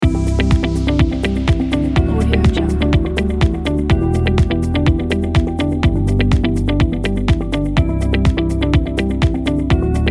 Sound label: corporate loop; wavesproduction; audiojungle